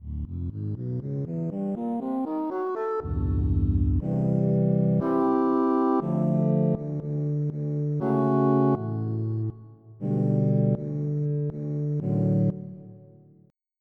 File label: Flute; FF7